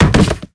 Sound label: bodyfall wood